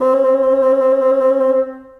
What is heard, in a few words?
Bassoon Solo Half Step Trills; Ableton; Orchestral Woodwinds